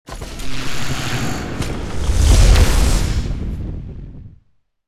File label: Death Wave